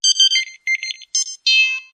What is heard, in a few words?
Quick Beeper Tune